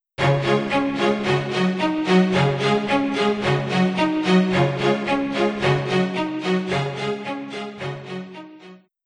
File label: Ratings Appear; Buildalot